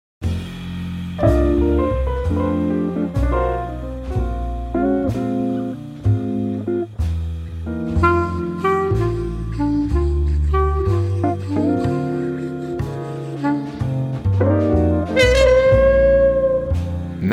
zen blues

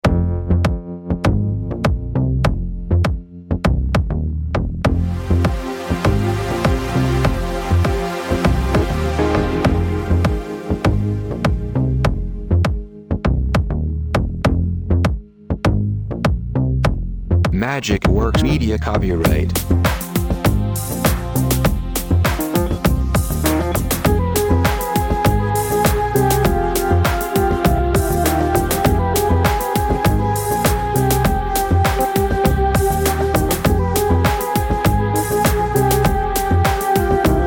electro

